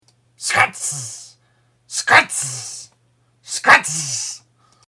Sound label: raygun; rayguns